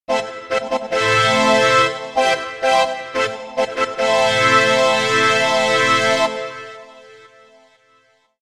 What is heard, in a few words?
Cartoon Fanfare